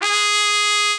mexican trumpet

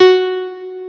piano

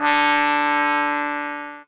sax

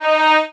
violin

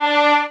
violin

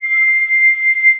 flute

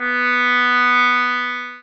sax

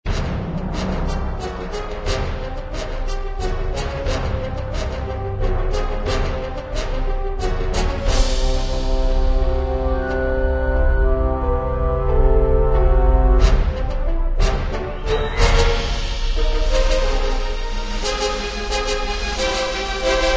battle theme loop

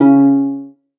lyre

